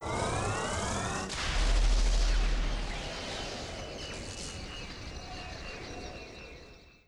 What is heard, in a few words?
Translocation